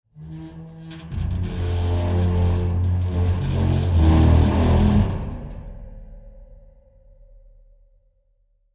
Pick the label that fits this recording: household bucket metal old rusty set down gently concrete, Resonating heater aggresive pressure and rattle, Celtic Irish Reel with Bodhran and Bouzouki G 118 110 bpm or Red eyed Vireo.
Resonating heater aggresive pressure and rattle